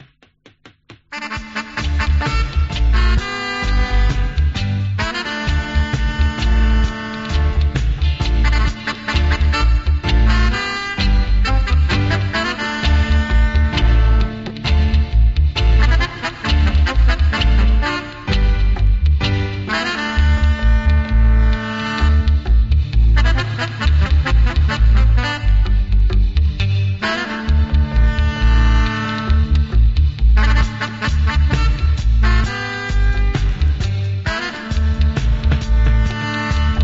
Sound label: Roots Dub